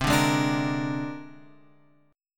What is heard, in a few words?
C 7th Suspended 2nd Sharp 5th, guitar; C7sus2#5 Chord {8 5 6 7 X 6} strummed